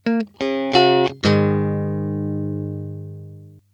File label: JCM900